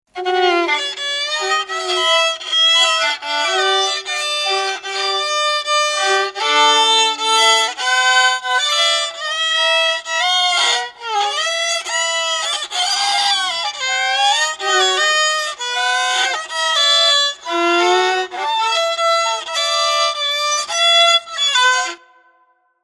Screechy Violin, Various screechy cat like violin phrases.; Strings